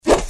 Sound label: hit